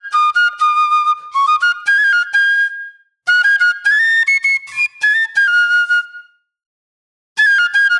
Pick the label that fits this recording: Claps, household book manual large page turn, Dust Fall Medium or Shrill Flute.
Shrill Flute